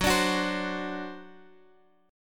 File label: D 7th, F sharp